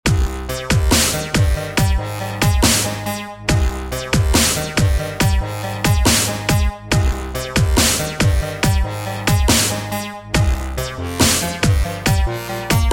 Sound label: Techno step